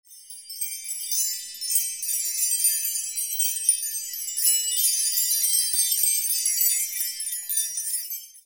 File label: BELLS